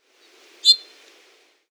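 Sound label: single squeak of an oriole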